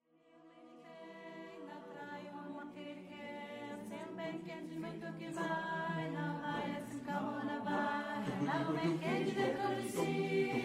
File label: Berimbau